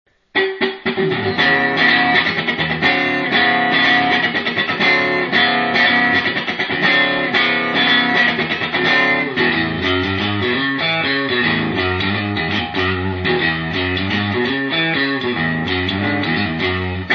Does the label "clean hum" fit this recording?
Yes